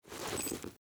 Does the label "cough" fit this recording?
No